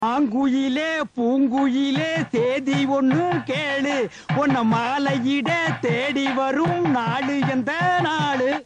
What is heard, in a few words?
sings; koundamani